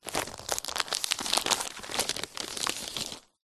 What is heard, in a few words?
household magazine thin freebie light movement rustle; zapsplat